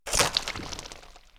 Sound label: Squash